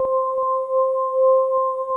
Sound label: GLOWY ORGS, pads; Pro-Rec - Synthsonic